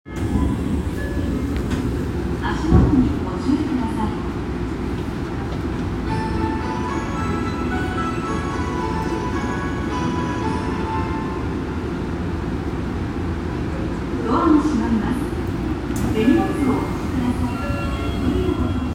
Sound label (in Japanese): 東西線神楽坂東, train sounds